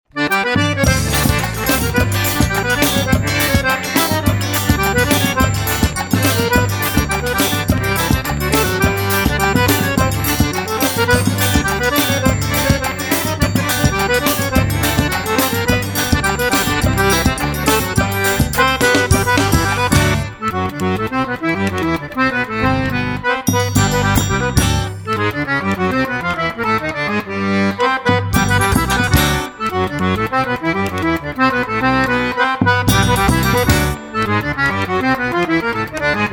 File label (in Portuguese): acordeon